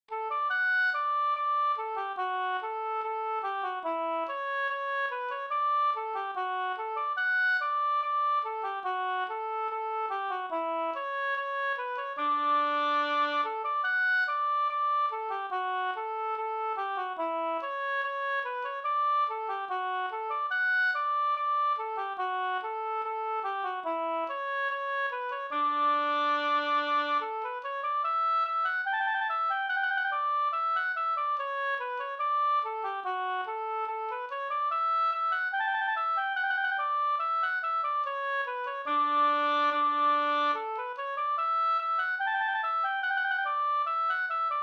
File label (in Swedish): skanklat; Skänklåt från Husby-Oppunda, Södermanland